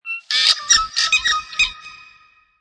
Tui call, Exotic bird - New Zealand Tui calling and cackling; Birds; Sound Effects:Animals